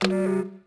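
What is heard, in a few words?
City Button; interface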